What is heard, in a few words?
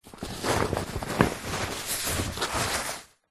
luggage sports duffle bag movement gentle